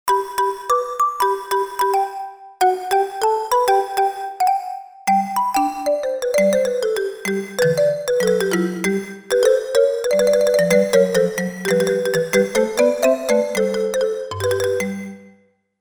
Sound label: Marimba, Roland JV-880; Factory Patches